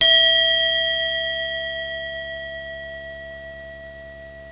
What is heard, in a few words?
bell; WAV Sound Effects; bells